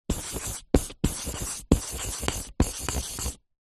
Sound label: office marker write whiteboard